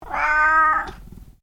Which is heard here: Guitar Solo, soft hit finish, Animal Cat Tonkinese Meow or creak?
Animal Cat Tonkinese Meow